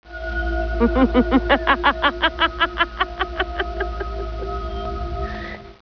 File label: laugh; Evil Alti laugh.